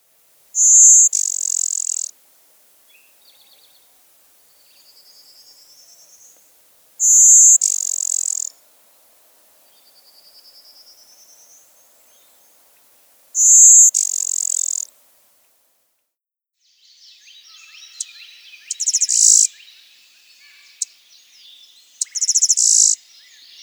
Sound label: Blue-winged Warbler